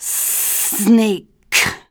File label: Snake